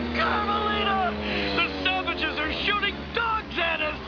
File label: dogs; The natives are shooting dogs!; The Tick